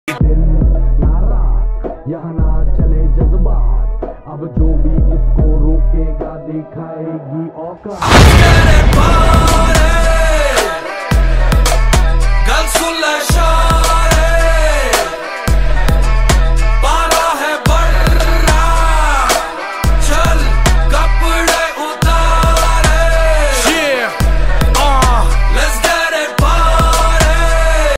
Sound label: slowed ringtone